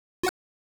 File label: fireball sound; Mario